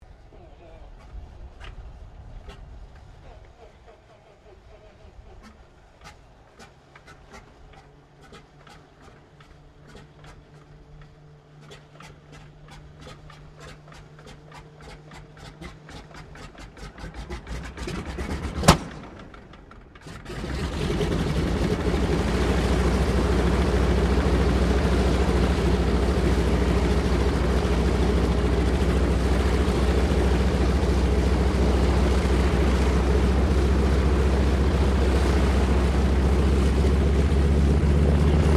Aircraft CAC CA-25 Winjeel startup taxi, WW2 era Australian training aircraft; Airplanes